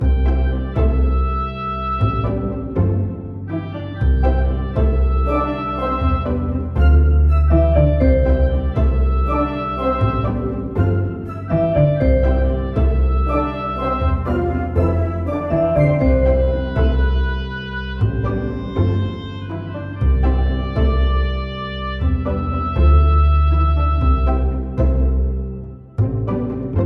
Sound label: Loop 120bpm; jenny leclue detectiv